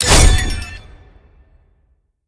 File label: pwr charge; smite; tiamat